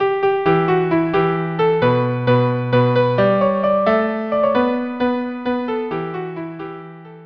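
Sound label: violin cello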